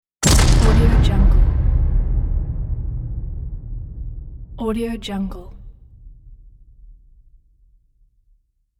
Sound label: hit explosion